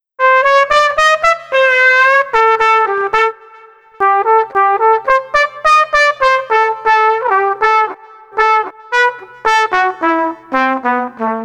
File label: Street Trumpet Cool 110